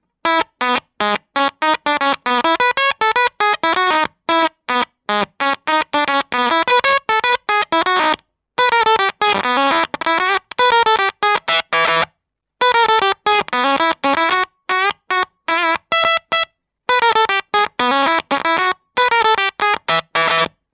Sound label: Fuz Box oscillation